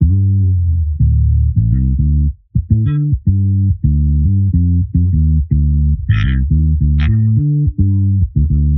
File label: salsa Gm BASS; multitracks; G menor